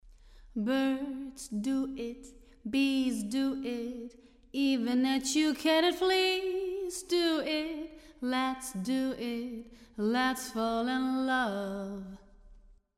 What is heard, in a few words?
Small Hall